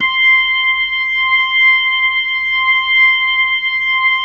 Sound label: C6, B3